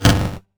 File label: Bent Tom; glitch; Bent Hits